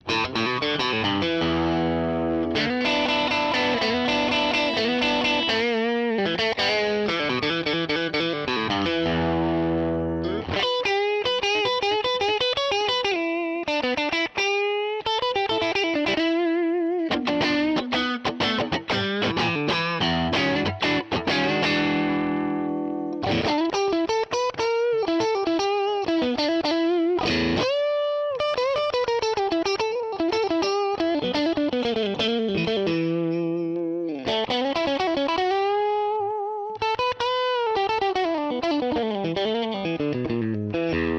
Crunch Bridge Bridge Middle Bridge Middle Neck, guitares; Muse kit micros HepCat série L configuration câblage Memphis position chevalet puis chevalet+milieu+manche crunch.